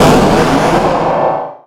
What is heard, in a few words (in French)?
Cri Méga XY